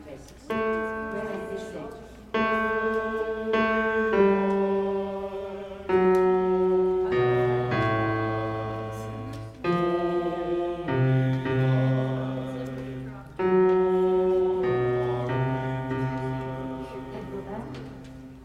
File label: bass final refrain